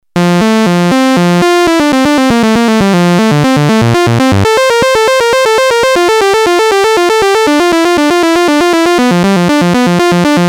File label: seq bach, Moog minitaur; Synth: old commodore 64 game; analog monophonic synthesizer; 2 VCO oscillator